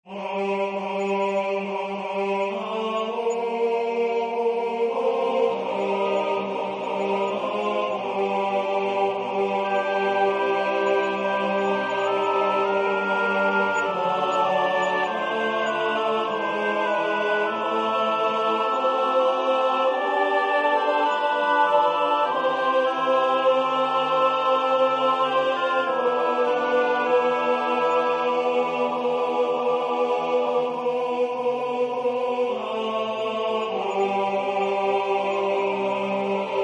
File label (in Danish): tenor; Skal blomsterne da visne